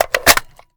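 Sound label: assault rifle reload fast magazine in, weapons; viper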